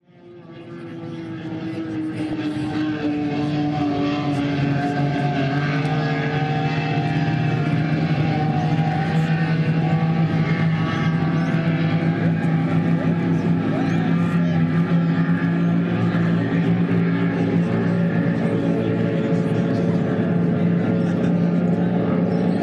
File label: sydney boat race port jackson